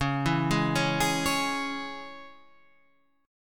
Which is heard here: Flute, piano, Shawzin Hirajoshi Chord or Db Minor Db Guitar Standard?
Db Minor Db Guitar Standard